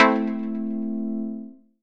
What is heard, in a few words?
Synth Shot A; Mutekki Media; Vengeance Electroshock Vol.2